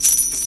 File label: Nano kristal